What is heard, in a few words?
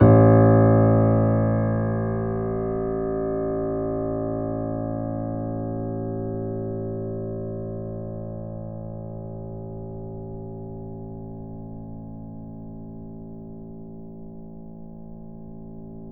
Grand Piano A0 mf